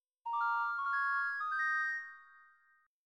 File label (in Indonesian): Nada Dering Nokia Jadul Message